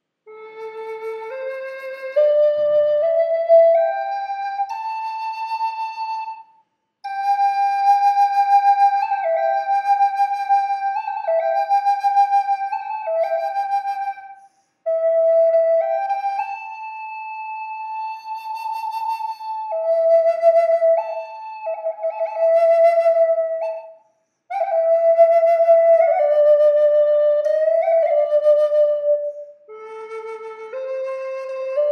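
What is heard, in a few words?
reverb, flute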